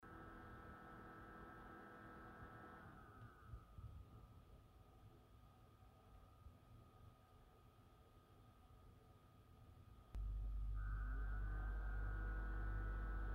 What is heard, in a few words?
Predator X35 fan noise